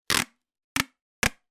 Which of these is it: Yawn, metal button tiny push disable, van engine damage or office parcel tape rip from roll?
office parcel tape rip from roll